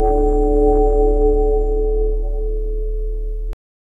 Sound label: bell tower playing a deep, ceremony